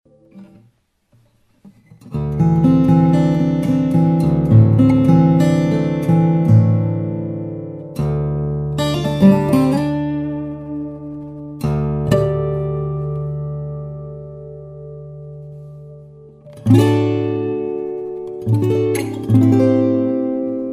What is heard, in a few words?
Granadillo Bard Guitar